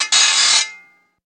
Metal Grind Wheel, grinders

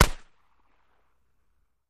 Gun Shot Dry Sngl Sho, shots

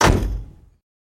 Chevy Car Door Close

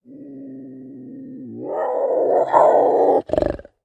Leopard Growl Hollow; big cats

